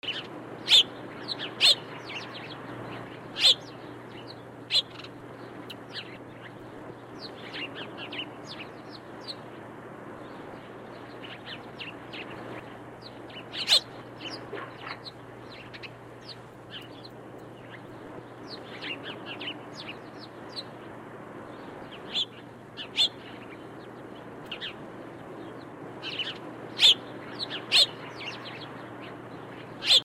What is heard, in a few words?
birds sparrows